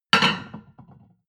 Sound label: plate on plate place hard, restaurant cafe